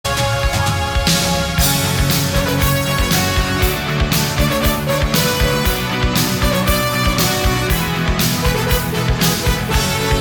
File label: tone